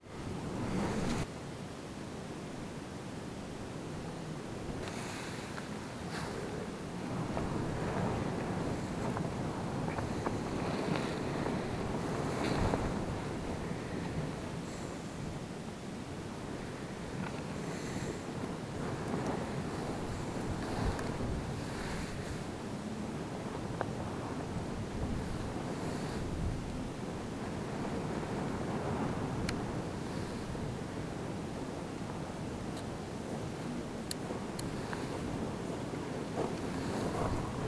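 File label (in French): Vent, Paysage sonore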